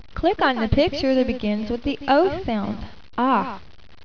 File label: OHH